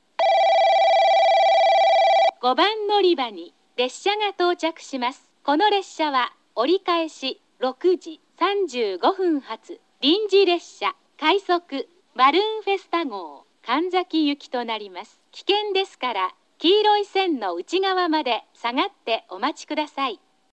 balloon hizenyamaguchi rapid kanzaki, portable recording